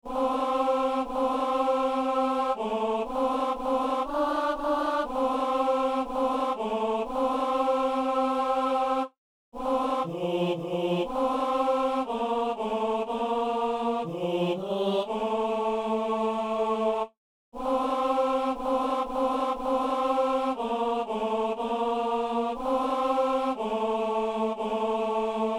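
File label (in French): ténor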